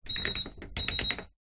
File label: household clothes dryer drying options dial turn beep